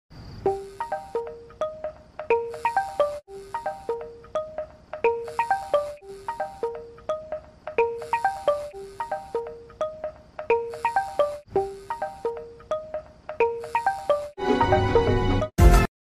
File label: oppo f9 pro, ringtone